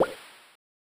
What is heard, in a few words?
Water Hazard; Frogger; SNES